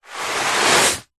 household cleaning wiping carpet with dry tissue paper single long wipe; zapsplat